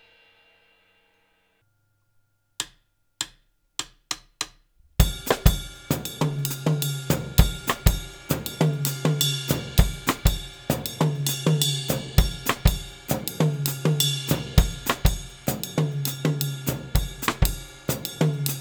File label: Guaguanco, Percussion